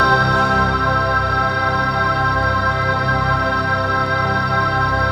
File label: ATMOPAD; Best Service; XX Large; Pads